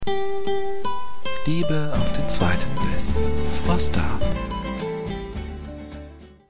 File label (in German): frosta jingle